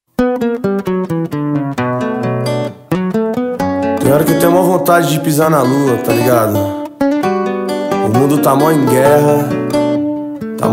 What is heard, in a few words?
hip hop